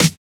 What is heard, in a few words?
Snare; FLPRO